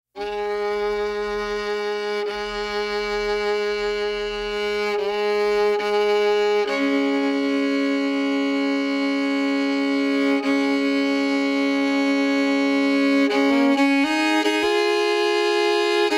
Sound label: Violin Intro